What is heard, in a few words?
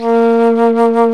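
Alto Flute vibrato; Roland L-CDX-03 Disk 1; A.Flt vib 2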